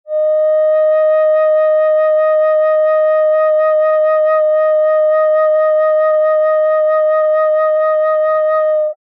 Small Eb, Flutes Single Note

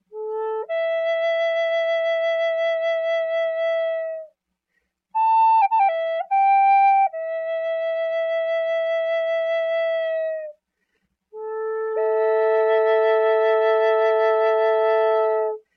Medium A Red Heart Drone, flutes; FlutesDrones

